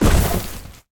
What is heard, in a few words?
Bodyfall; Smite; god Kumbhakarna